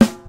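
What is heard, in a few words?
low snare single hit a key, tuned to the A note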